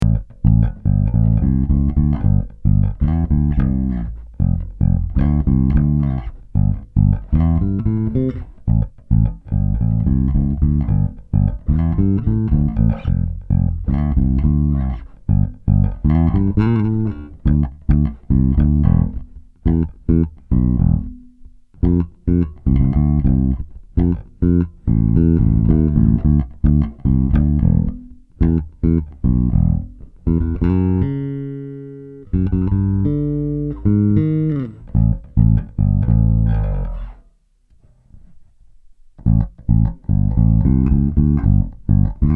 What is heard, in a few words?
Fodera M+C Passif Tone Mi, basses; fodera5